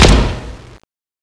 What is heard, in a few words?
saw fire; weapons